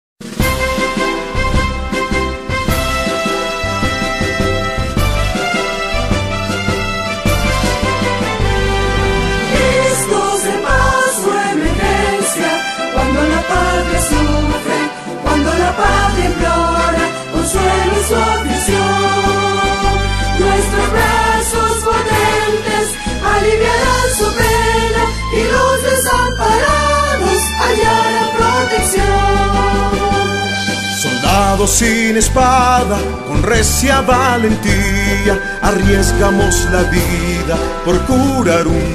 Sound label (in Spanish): HIMNO LETRA; DEFENSA CIVIL COLOMBIANA